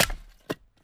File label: Split Wood